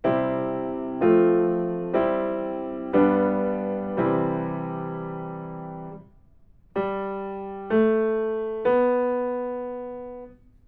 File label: melodic fragments; ear training